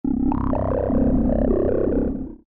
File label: atmos hells bubbles